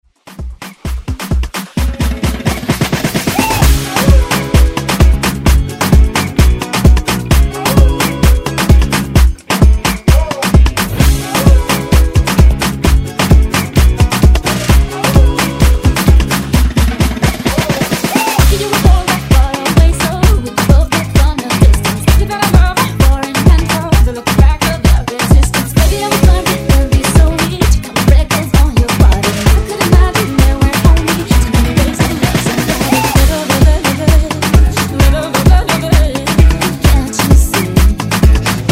Afrobeat Redrum; remixplanet

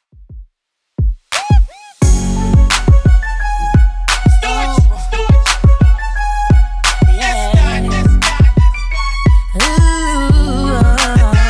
2ks DJ Friendly Redrum 87bpm

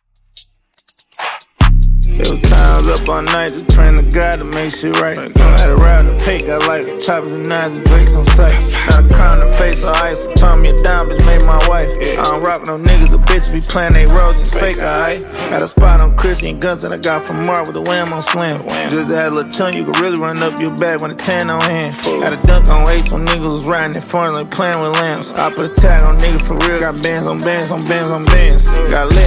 72Bpm; Redrum

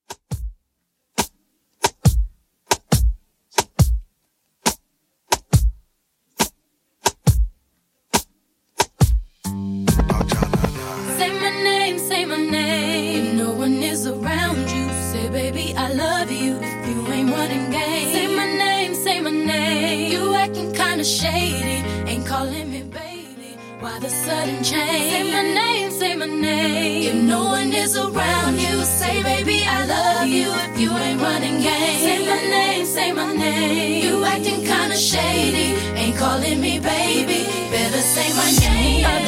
90s Rnb Redrum 69bpm

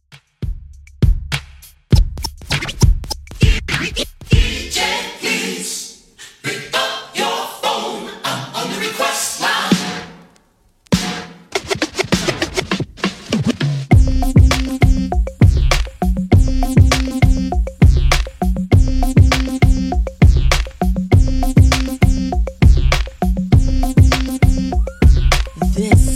Hiphop DJ Friendly Redrum 100bpm; remixplanet

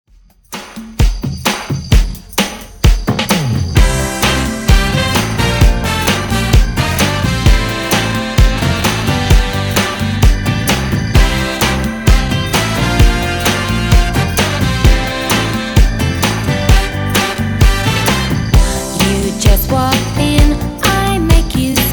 Re drum

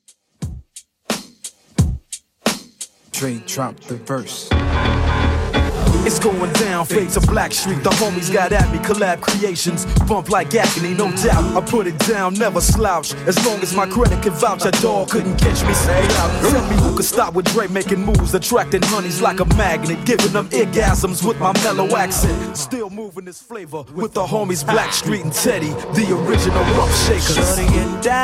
90s Rnb 88bpm CLEAN

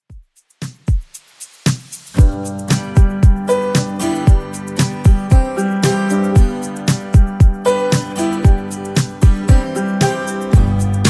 70s Redrum 115bpm

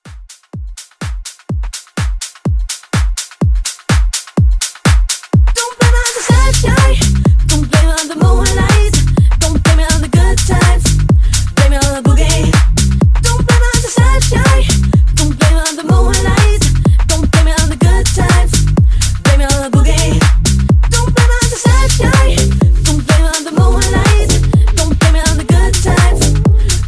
Club Boogie 125 CLEAN

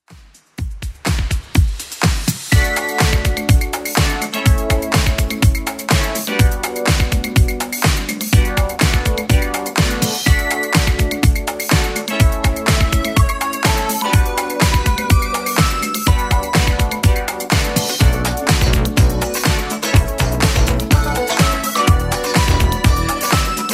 80s Club 124bpm CLEAN; 80s Club Edit

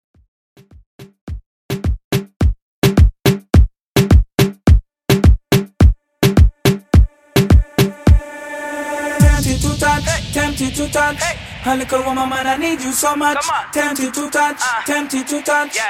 Epic Intro

